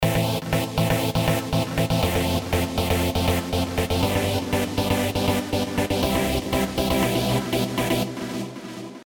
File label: Synth